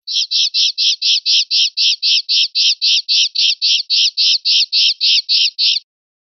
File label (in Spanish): rep rabieta achillonada, pardillo